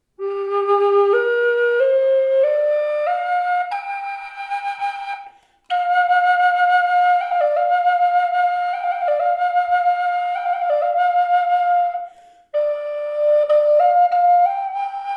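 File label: reverb, flute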